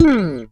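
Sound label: SB SLIDE, SPECTOR BASS; Northstar - New Gold VOL-1; SPEC.FUNKBAS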